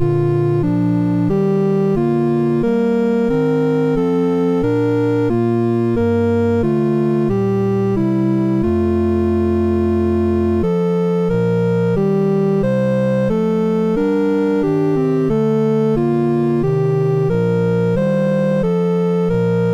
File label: St Anne Hymn, MOS6581R3; St Anne Hymn No289